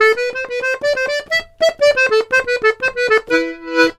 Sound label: RONDO, Accordions; USB Soundscan vol.40; AKAI